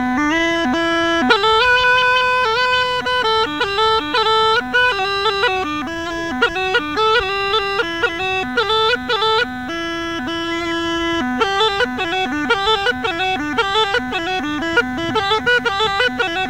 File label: song slowly, Rodope Gajada